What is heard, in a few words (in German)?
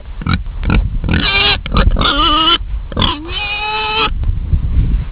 schwein; worldtune